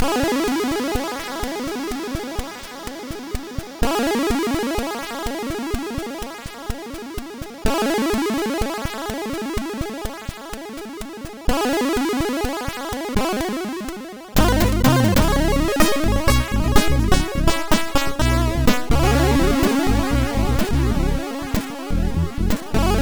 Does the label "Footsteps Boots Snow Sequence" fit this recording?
No